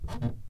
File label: Underneath stairs footsteps; Phasmophobia